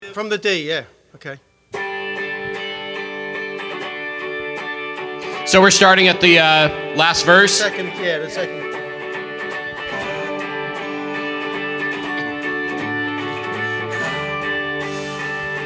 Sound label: Vocals and Background; last verse